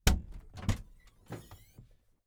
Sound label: Datsun 240Z, Hood Open Interior; Nissan Datsun 240z Fairlady 1973; AMBEO4; Pole Position